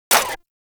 Pistol Mech, weapons; Warframe; Thanotech

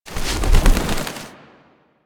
Bird Three Wing Flap; Warframe; Ambience; Entrati

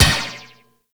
Ball Bounce Metal; Warframe; Missions; Tenno Ball

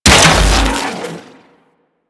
shock rifle fire; Warframe; Weapons; Corpus

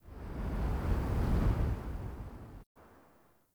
Fort Wind Gust Soft Buffet Airy; Ambience

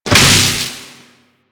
Fortress Cable Connect Stop

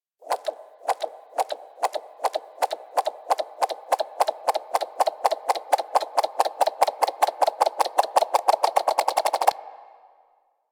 Pit Monster Countdown Ten; Warframe; Infested

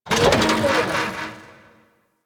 Servo Flutter, Ambience; Warframe; Corpus Railjack

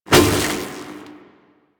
Whoosh; Warframe; Quests; The New War; Drifter

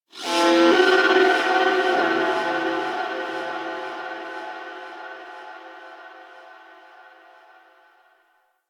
Texture Distant, Ambience; Warframe; Cephalon Weave; DE

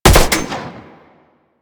Fire Mech, Weapons; Strun

